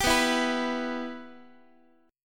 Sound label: B Suspended 4th Sharp 5th down Guitar; Bsus4#5 strummed; JGuitar